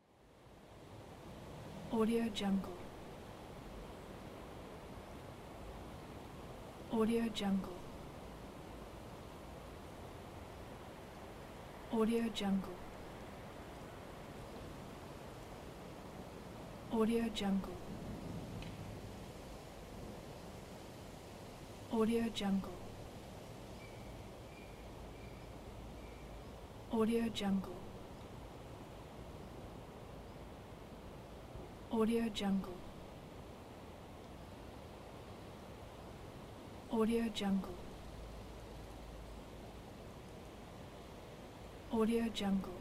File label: soft wind noise in the forest ambient atmosphere; jdproduction